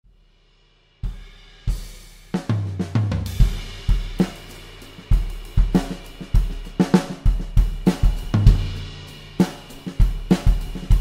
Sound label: big beat groove